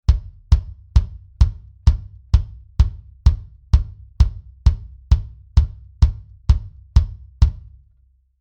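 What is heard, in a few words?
bass drum Mic Test MD441 Mix wet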